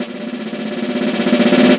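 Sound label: orchestral snare roll [E2]; Star Trek JR Audio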